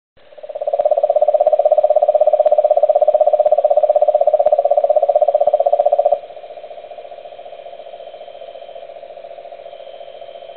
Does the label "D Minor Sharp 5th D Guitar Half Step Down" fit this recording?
No